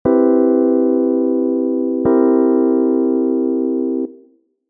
cmaj7 v c7

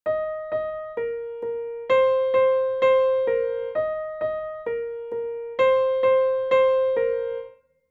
intro top note

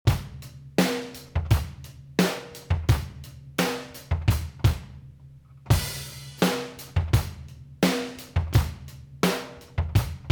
drums after

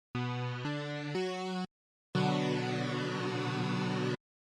Chord Spectrum

